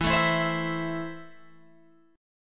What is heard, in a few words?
E Suspended 4th Sharp 5th, Guitar Open G